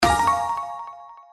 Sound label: Bonus Super Wheel Reveal; slots; Outdoor America